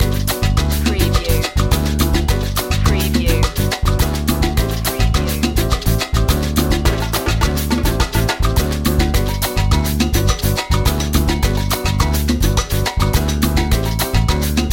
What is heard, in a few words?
samba groove